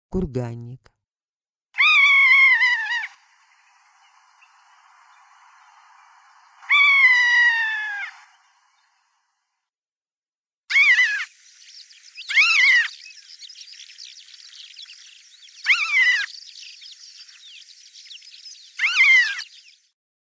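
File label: long-legged buzzard voice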